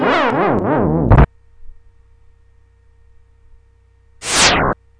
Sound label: game over; tfc; zelda